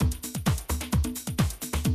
130, Classic DrumLoops

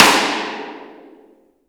RIM; Best Service; Real Mega Drums VOL-1

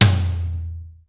LAUNCH TOM M, drum; Crypton - DrumBase5000

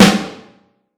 Vintage Snare Drum

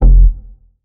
Bass F, Bass Synth

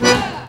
ACCORD HITS; Best Service; World Colours

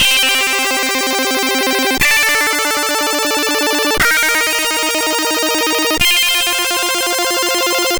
C64 Chord, 8-Bit chiptune

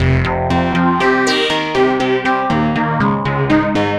Climb Synth, Synth Textures; Sonic Foundry (Sony Creative Software); The Electronic Music Manuscript Collection

